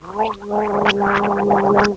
Gargle; Princess and the Pea; SoftKey

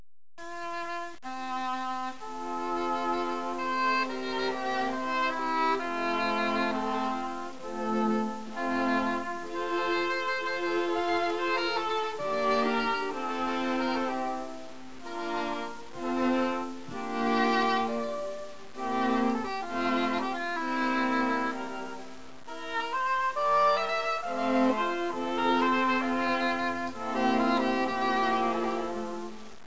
QUARTET

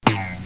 HAT BOUNCE

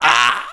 death; willofsteel

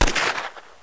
PISTOL

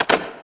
Locked; SFX; PC Gamer IT CD 38; thief

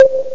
PLUP; eXtended Instrument

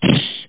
tsch; 8,012 sample rate; Ragnaroek; Ragnarok; Sun Microsystems Audio File

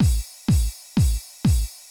drum loop house 125; eJay

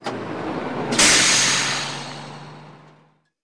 BUS STOP; buildings

